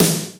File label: drum hitclap; Osu!; HentaiKontrol